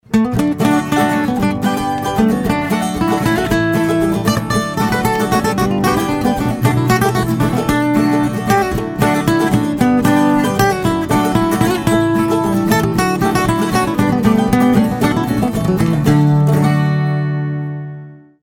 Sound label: Country Cue